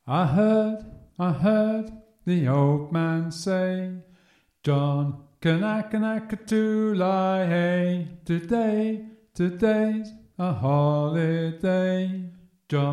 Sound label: tenor; John Kanaka